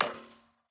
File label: Battery Put Down